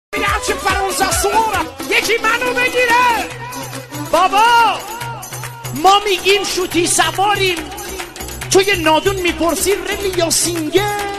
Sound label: remix